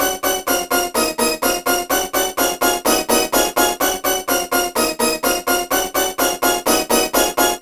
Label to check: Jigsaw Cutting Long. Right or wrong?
Wrong